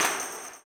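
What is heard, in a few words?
tambourine, 160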